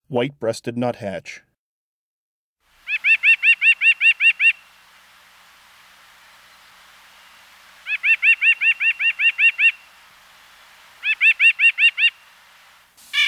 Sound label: White-breasted Nuthatch, Birds of the Chicago Region